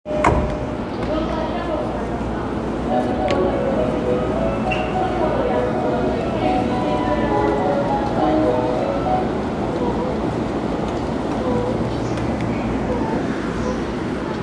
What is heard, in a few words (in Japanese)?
JR中央線快速国立西, train sounds